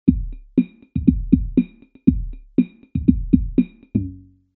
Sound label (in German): Loop mit Vocal Filter